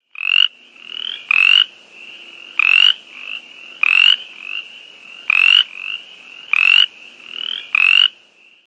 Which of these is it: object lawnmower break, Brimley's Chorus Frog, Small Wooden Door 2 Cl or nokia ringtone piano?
Brimley's Chorus Frog